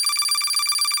countup Loop; netent

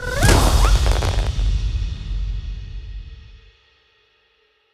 big Win Transition; netent

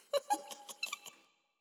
giggle; netent

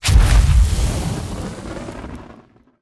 bonus Arrow Shot Fire Hit; netent

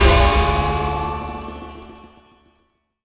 scatter Hit; netent

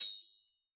button max bet; netent

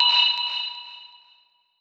count up end; netent

